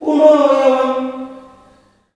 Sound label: OUU MO, MALE; Spectrasonics; Heart of Africa 2; S.MALEAMB